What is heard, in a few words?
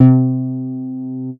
MOOG BASS C4; Best Service Dream Experience